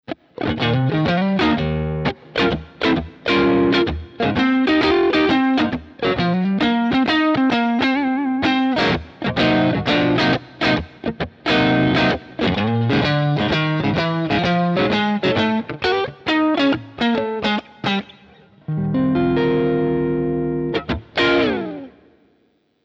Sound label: BOSS GT1000V3 Match Combo REV